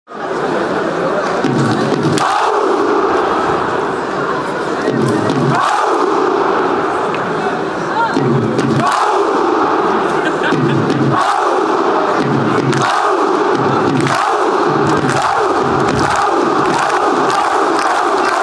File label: ahou, fanchants